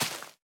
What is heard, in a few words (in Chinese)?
大型垂滴叶向下倾斜声